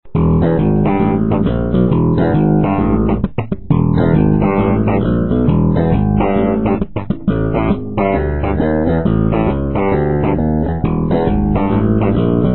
slap, baixo

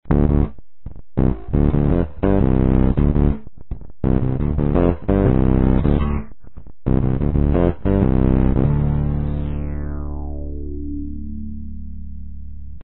bass micro synth

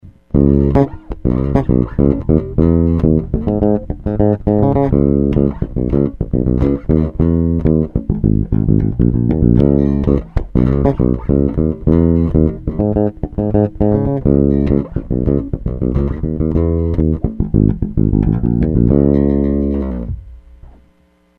fender badass mid, baixo

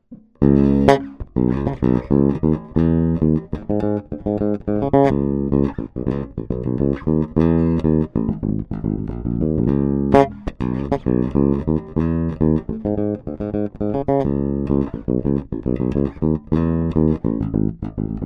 fender deluxe ativo mid, baixo

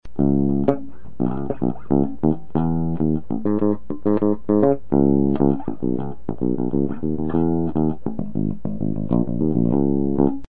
mm mid, baixo

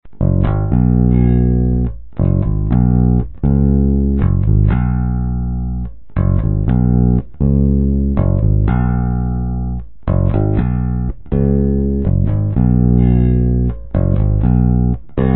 fodera precision, baixo

